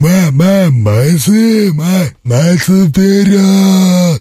zombie attack

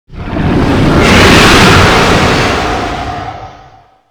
dragon voice

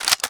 bolt back, g3a3; weapons

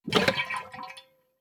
flamethrower take ammo

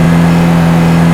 simulated vehicles low

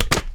mantle start

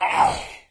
pain; doom3; commando

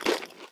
eat

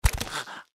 feral ghoul foot run, npc; ghoulferal

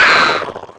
injured

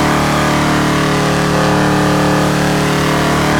dukes mid, simulated vehicles; gta5

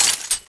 clip in; tfa cso; x-7

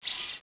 idle, weapons; cso

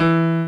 piano

